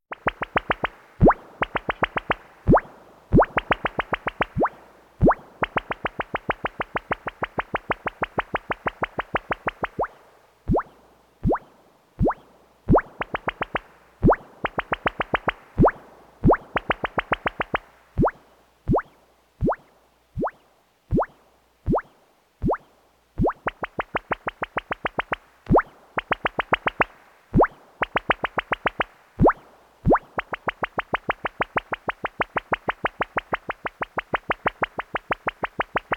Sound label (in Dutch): Lava Stroom, science fiction effects; KMP Film Video Sound Effects